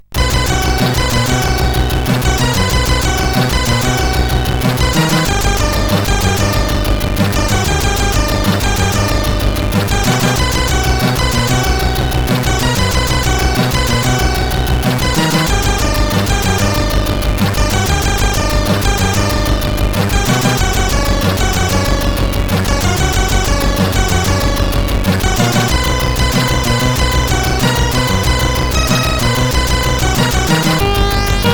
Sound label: Five Minutes, CSG8580R5